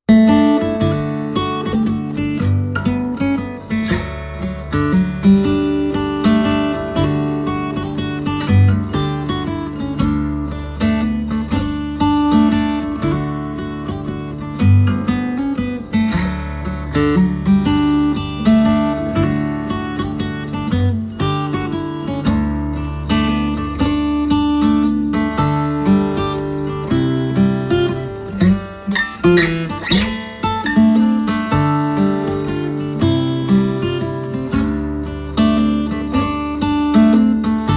Mazurka

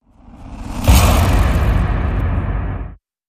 Hit; Sttr Indelible Mark

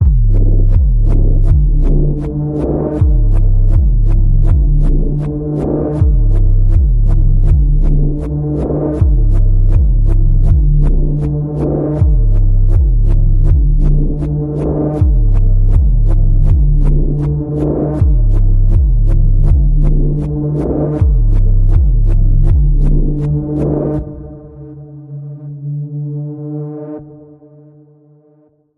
Panning Pulse D, Key of D

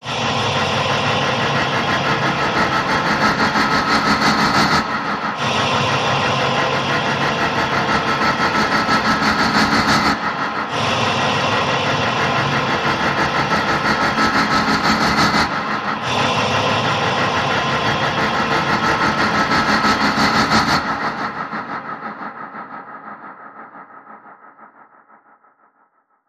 Breathless Cult Pulse

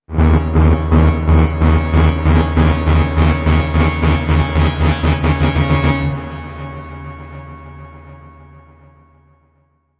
Blipsoid Sting

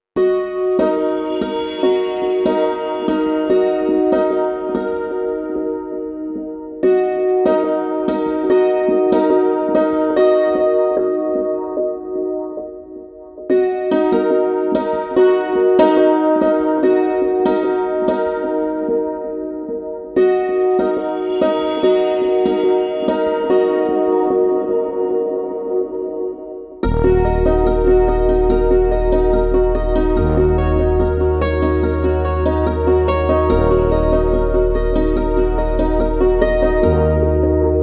Alpine Ambience, Underscore

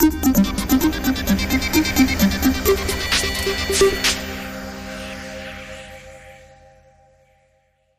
Sonic Blips

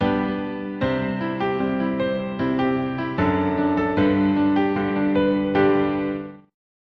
Crowning Achievement, Sting

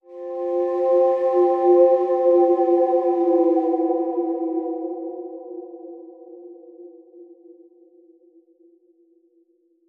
Strange Shadow Mystery Bend

